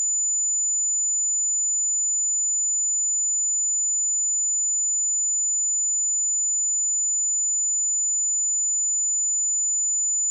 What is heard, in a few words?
7kHz